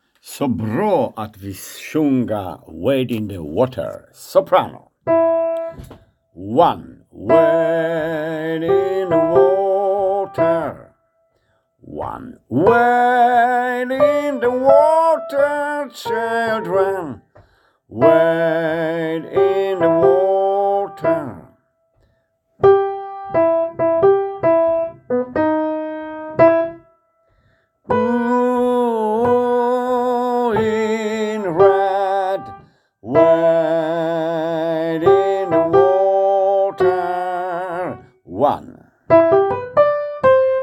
sopran

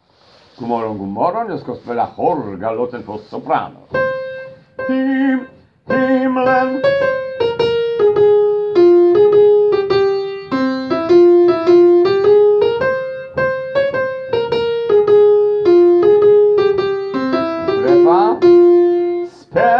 sopran

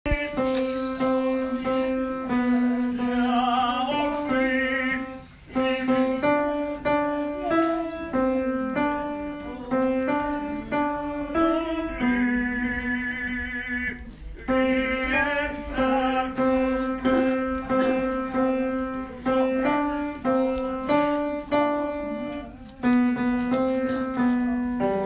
tenor

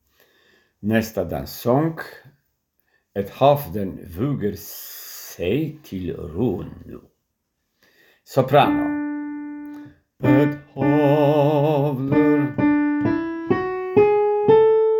sopran

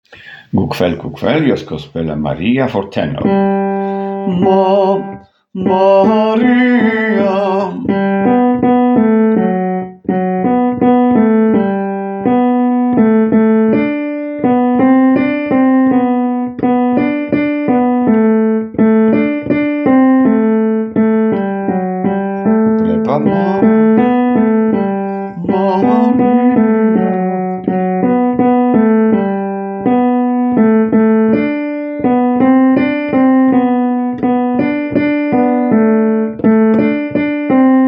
tenor

